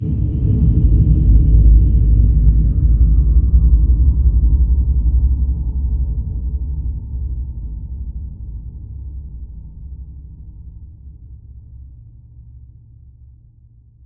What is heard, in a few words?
cables blast int deep; Half Life Alyx; music; vault